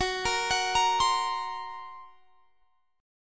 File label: Gb Major Flat 5th, down; GbMb5 arpeggiated